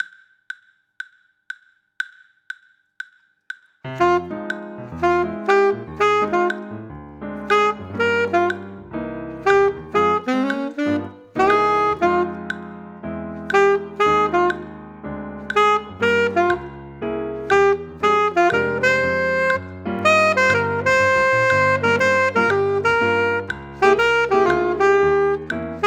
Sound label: alto and piano, sax